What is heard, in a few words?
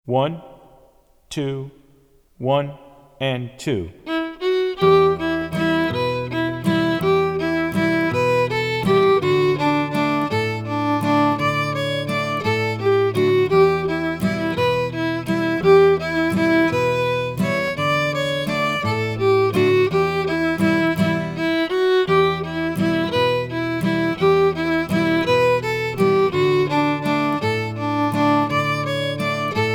swallowtail jig practice version